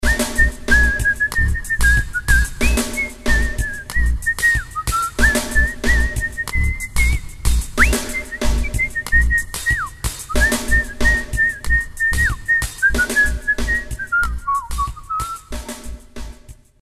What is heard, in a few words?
Whistle